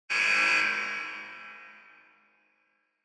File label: Electromagnetic Buzzer Hall